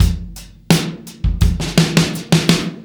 Testos 084; Zero-G; Monster Beats

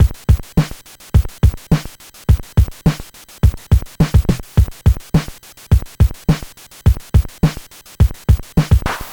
NES Drum Loop; Sonic Foundry (Sony Creative Software)

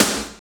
snare splat; Roland L-CD701; Snares 3; Sn Modules 3

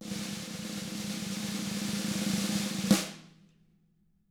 roll, snare; ILIO; Double Platinum Drums 1

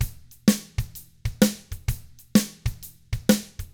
shuffled, Drums; ILIO; Double Platinum Drums 1

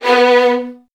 B1, VN 16TH, Virtuoso Strings; ILIO; Kirk Hunter

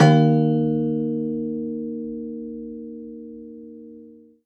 Rubbery Piano, prepared piano; D0; Total Piano

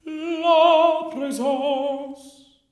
TEN. TRAD; Spectrasonics; Symphony of Voices

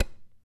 CHARANGO FX; Guitars; Spectrasonics; Hans Zimmer Guitars VOL-2

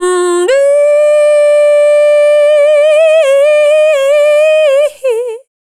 FEMALE, Dance Vocals

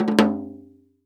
CHANGGO, KOREAN PERCUSSION; AKAI S6000 CD-ROM - Volume 5

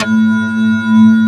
Prophet Five; E-MU Formula 4000 Series Vol. 3 – Analog Odyssey

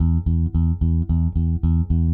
Eighth Notes E, Bass; Bass X

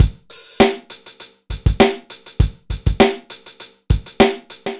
dry loop, BPM100

